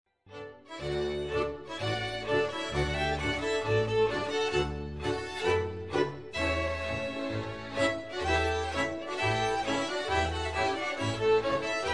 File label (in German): Boarischer